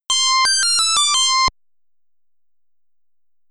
Boot; P.O.W. Prisoners of War; arcade

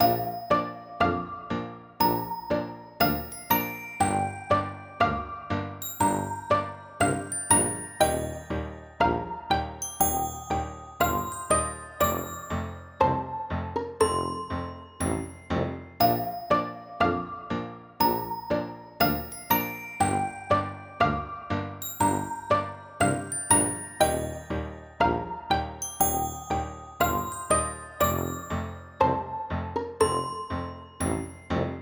Menu Bg; finger guessing king 2020 gamerip

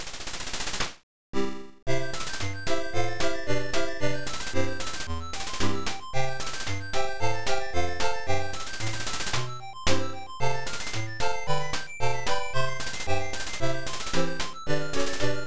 All Clear; solomon s key arcade machine arcade

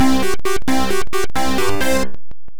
Quiz - Punchline!

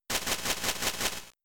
Sleep Powder

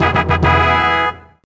Jingle Power Up

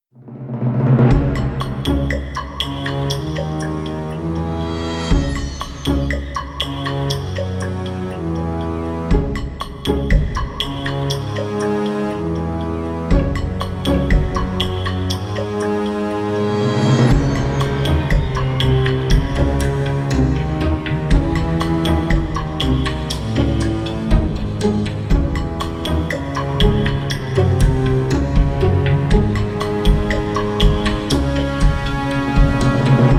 BGM

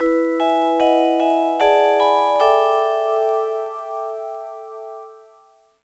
Jingle After Test; Kirihara Shoten Kanshuu Daigakusei Ryoku Kentei DS; NDS

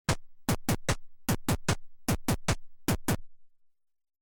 Drum Sound; Kaijuu Densetsu The Tale of the Shell Monsters

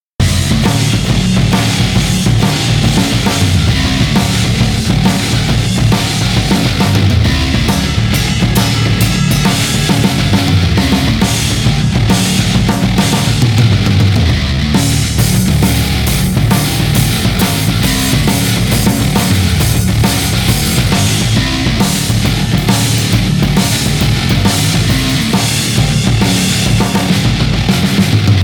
Layer 136bpm Loop

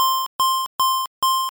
Future Sight

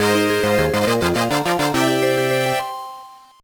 Jingle - Boss Clear; Wario Ware Twisted; gameboy advance

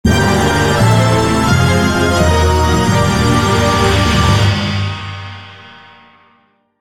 Beat Game Over; monster madness original game music

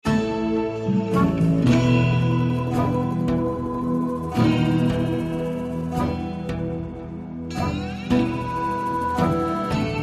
Loop Tense; tales from the borderlands gamerip 2015

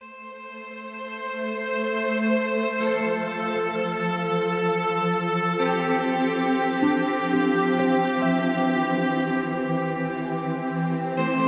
Fanfares; Songs of Syx; Fanfares of Honor

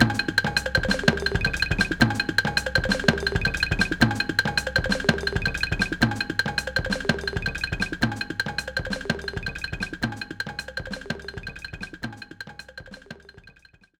Piano Processing...

